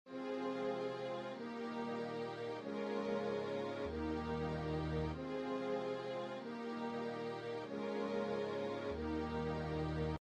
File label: strings